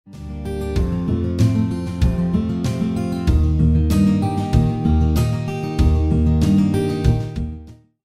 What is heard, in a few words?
Guitar; Guitar by Artificial Intelligence-2